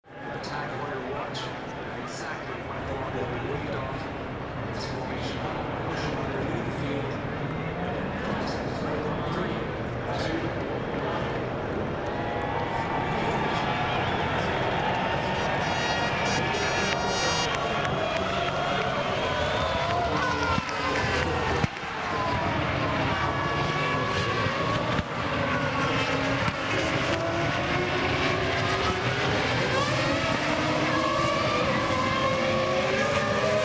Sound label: f1 race introduction